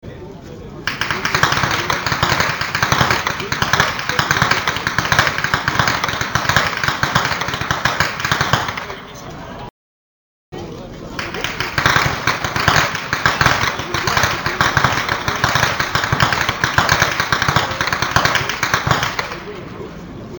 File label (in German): schnalzen, jugend; roth